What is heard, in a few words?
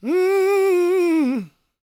gospel male, vocal; ILIO; Vocal Planet